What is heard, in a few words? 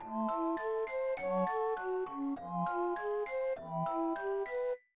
Unknown Jingle; Shining the Holy Ark 1996 Saturn gamerip